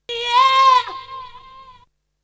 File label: Vocal; ZERO G - Datafile DISC 3